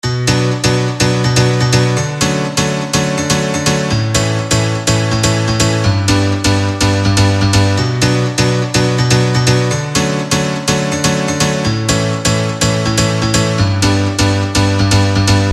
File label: melody loop